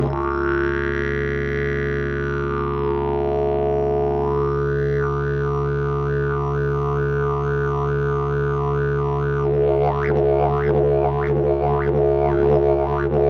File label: didgeridoo; jilm mrakomor